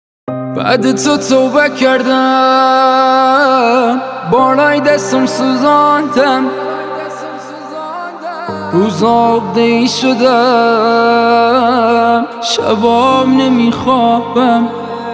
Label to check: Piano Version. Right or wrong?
Right